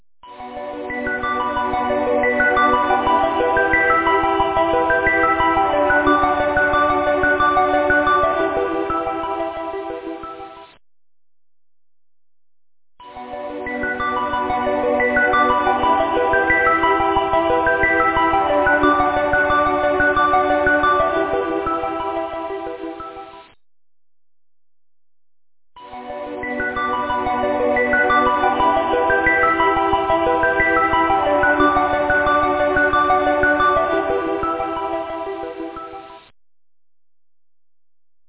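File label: flute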